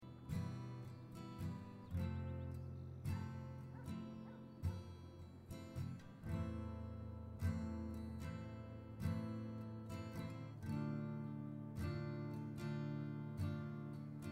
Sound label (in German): ingame menu musik; Life is Strange 2; gamesoundopedia